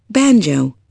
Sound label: banjo